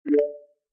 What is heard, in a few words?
join voice room; flotilla